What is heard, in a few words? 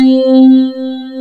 pluck C4, FM pluck